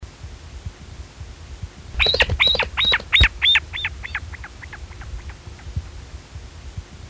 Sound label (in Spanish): Inambú Serrano, Nothoprocta ornata, Ornate Tinamou; cantos